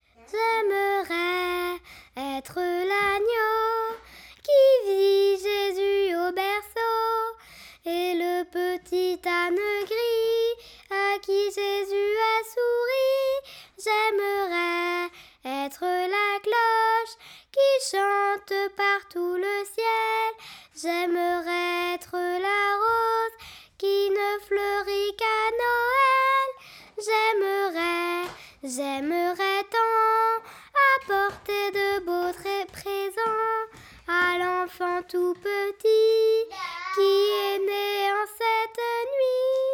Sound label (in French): chante jaimerais